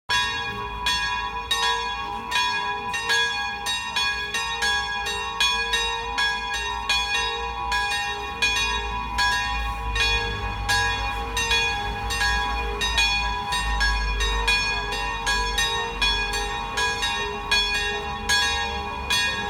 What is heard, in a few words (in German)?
Geläut; Broder Hinrick